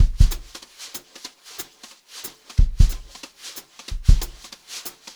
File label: jazzy beat, Brushed Drum Loops; 92 JAZZY; USB Soundscan vol.39; AKAI